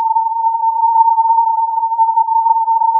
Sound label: filtered input